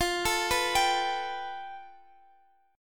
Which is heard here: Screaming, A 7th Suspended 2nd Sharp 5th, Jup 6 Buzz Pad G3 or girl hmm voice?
A 7th Suspended 2nd Sharp 5th